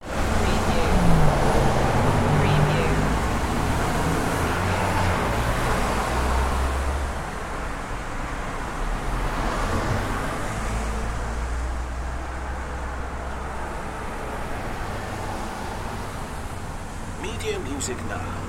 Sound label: Cars and Traffic